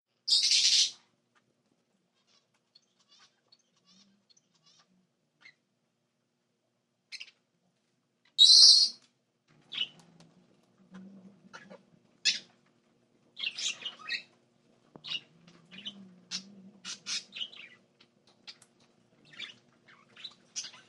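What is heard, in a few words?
Pet Shop Parrots Budgies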